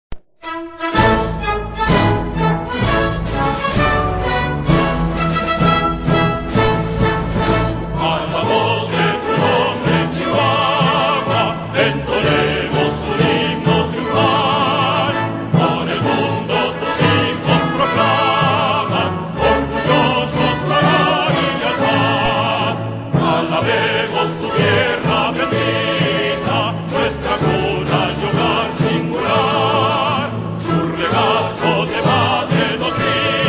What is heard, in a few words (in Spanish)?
Himno Chihuahua